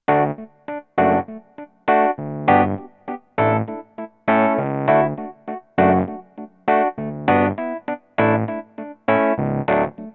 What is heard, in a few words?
Roland RD-88 1975 E-Piano